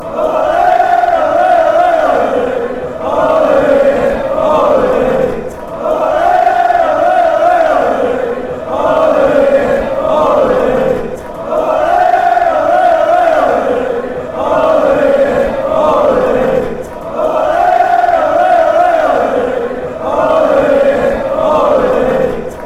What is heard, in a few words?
ole, fanchants; hawley